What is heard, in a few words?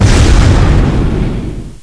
contact lava, debris; XDM